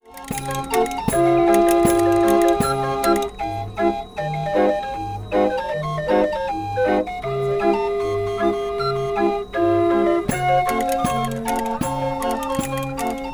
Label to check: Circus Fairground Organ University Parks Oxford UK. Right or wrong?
Right